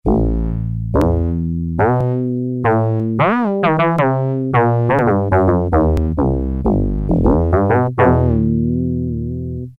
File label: Bass, Yamaha TX81Z